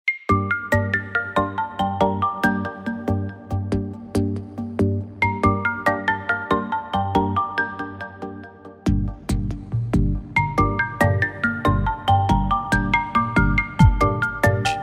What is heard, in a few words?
Dulcet Tone, ringtones; Infinix; HOT 40i